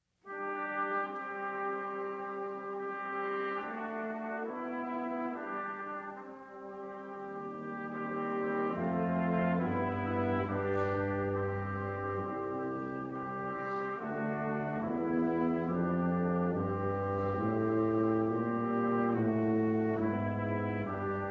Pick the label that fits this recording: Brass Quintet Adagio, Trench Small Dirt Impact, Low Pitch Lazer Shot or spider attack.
Brass Quintet Adagio